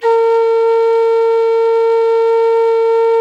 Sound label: A 4, reed flute; SoundBytes - Early Patches